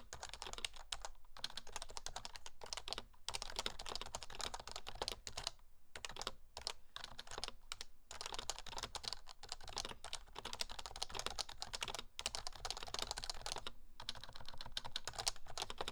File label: HHKB Pro Hybrid